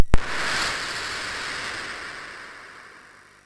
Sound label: MOS6581R3, sid